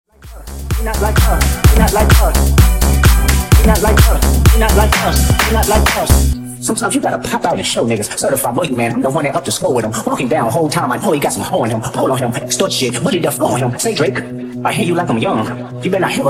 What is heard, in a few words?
Funk Remix 128 Bpm